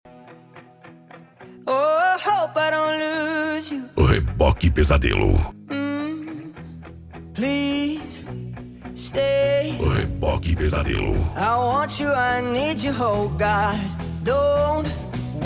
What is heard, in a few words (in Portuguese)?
sound car